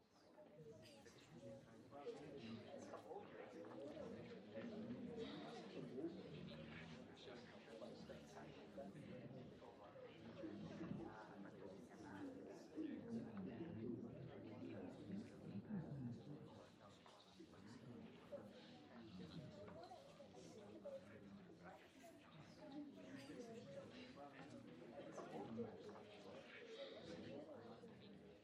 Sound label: Babble Noise